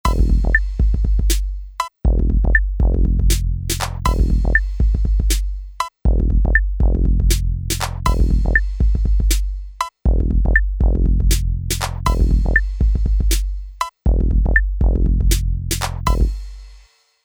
Ambient

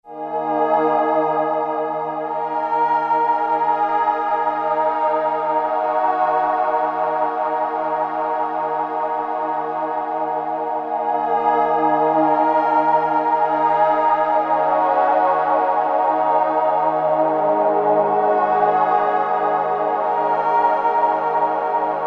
Quiet Pad, Novation XioSynth 25

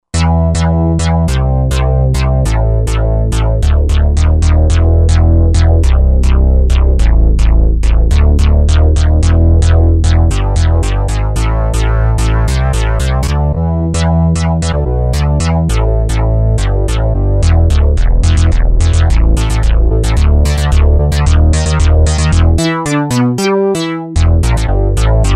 Britelow Bass, Roland JV-2080

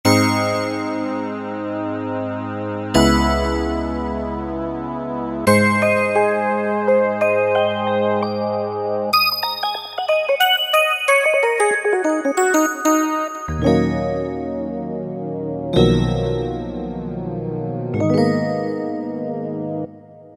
Bad Pad; Generalmusic S2R

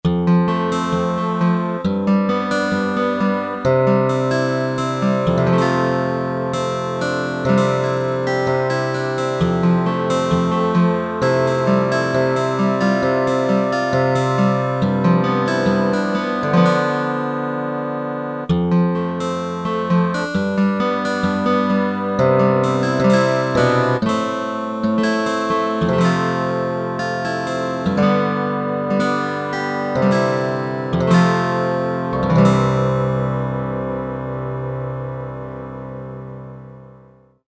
Folk Gtr, Korg DSS-1

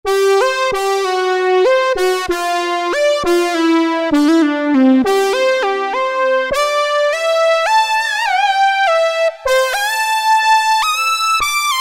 Lead Oberlead

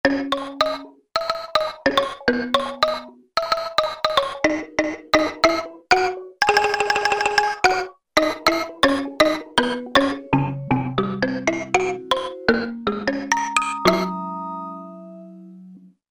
Blockarim., Korg MSC-1S; Korg MSC-1S Variety

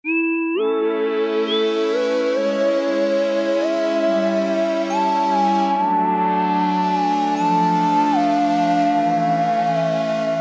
Str-Whistle, Roland JP-8000; Factory Performances

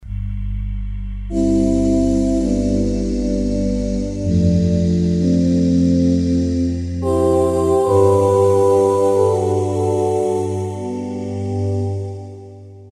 Voices, Korg M1; Factory preset examples

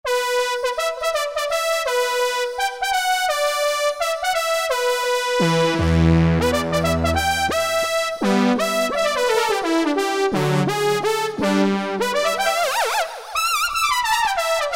Ld-Pro Lead, Yamaha EX5R; Factory presets

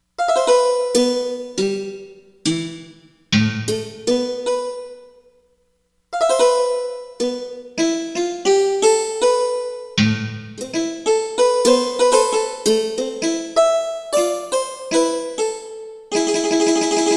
Santur, Korg MSC-13S Ethnic

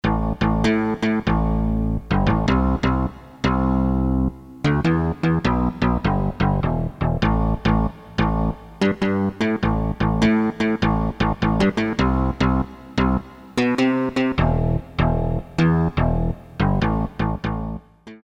DX16 BASS

